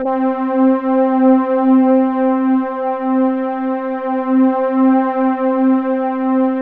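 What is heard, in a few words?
C4 big orchestra; Sound Gallery - Charged; BIG OBERPAD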